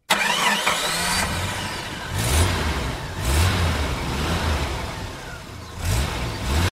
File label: car startening